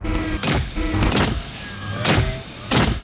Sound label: guardtower idle; bellato